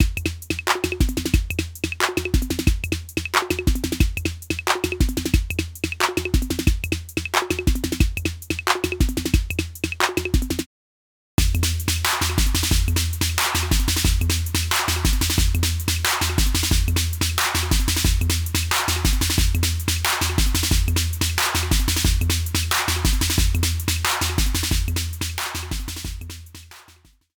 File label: 808 DRY WET; BEBECHERIE